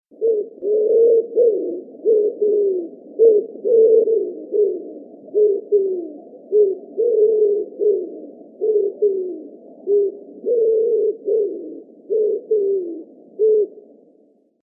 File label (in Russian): vjahir vorkuet